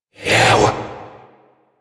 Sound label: Possessed Vocal Attack; Enemies; Warframe; Priest Quest; Possessed Red Veil